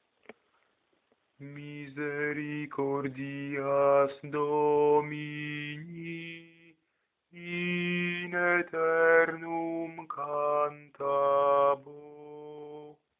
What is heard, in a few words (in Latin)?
Misericordias Domini alt